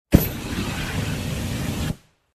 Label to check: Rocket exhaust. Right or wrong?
Right